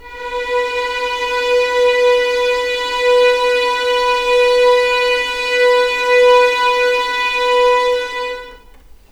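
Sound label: B4 legato mf violins R; East West; The Ultimate String Collection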